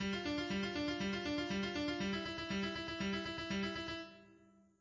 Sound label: Alberti bass